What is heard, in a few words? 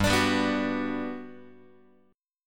G Major 7th, G, down, Guitar; strummed; JGuitar